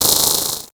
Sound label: Title Transition Digital Readout; Adobe; Production Element